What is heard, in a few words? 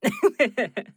Mage Laugh; Realm Royale; Wandering Alchemist